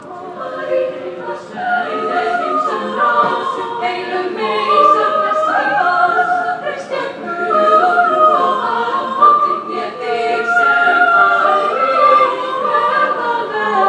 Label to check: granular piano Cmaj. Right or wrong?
Wrong